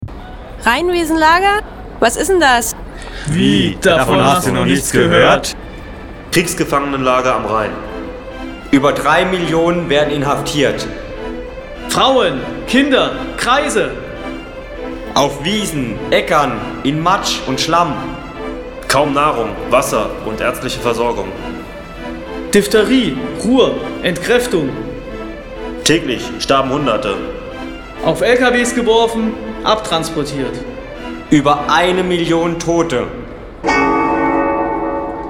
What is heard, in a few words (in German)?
Mobilisierungsjingle